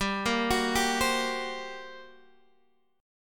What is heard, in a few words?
G Minor Major 7th Double Flat 5th G down; arpeggiated